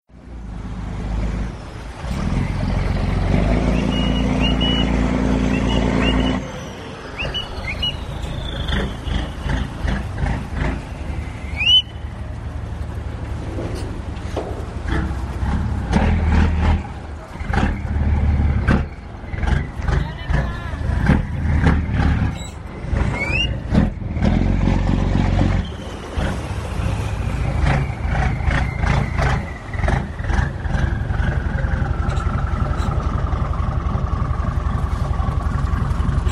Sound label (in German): klang